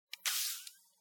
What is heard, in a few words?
hypospray, medical devices